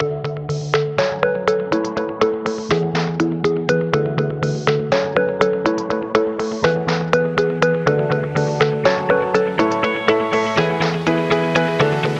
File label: Summer Beat